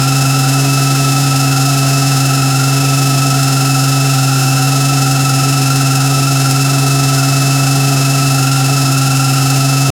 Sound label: 900cc motorbike full spee